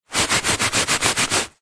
household cleaning wiping carpet with dry tissue paper fast wipes; zapsplat